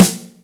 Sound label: Wood Snare, Yamaha DTXpress Acoustic Snare